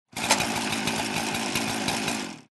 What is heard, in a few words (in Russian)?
zvuki benzopily